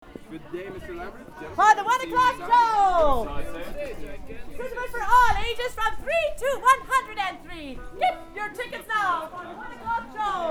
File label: barking